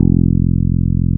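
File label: SMOOTH BASS F2; Drums In Your FACE!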